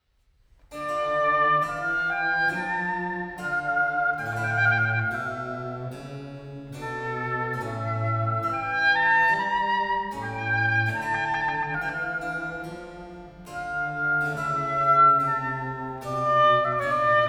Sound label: oboe; musikschule; klang